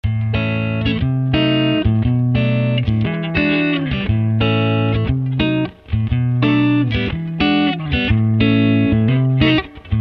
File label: Clean Tele